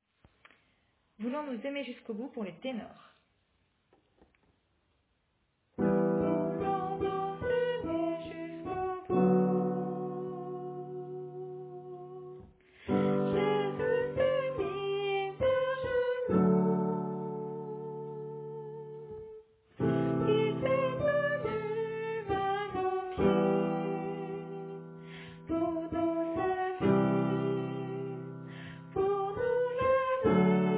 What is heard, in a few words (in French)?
Tenor